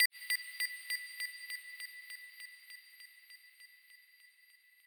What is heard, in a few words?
plack